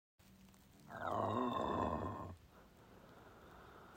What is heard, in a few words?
BIG Gurgles